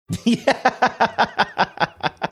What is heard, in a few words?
laughing